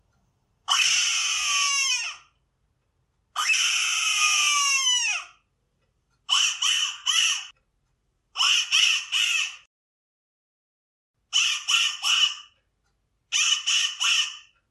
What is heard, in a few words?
FOX, fox call; FOXINATOR; The OAKWOOD Fox Call